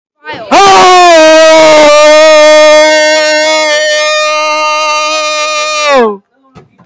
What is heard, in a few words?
extremely loud haa